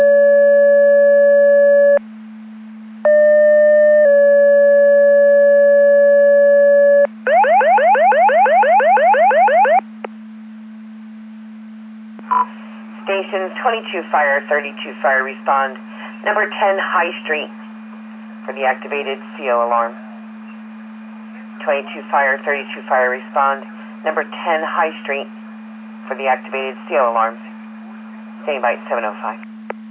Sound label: Siren; Andover Twp Boro